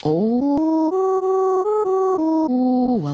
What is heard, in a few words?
Whistling